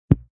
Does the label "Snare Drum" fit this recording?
No